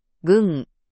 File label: gun